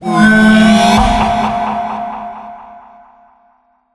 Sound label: combine alarm; ambient; alarms